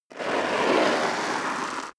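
step dress boots concrete grit slide long, footsteps; schritte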